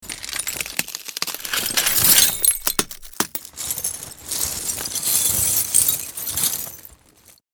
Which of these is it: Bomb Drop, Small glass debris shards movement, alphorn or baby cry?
Small glass debris shards movement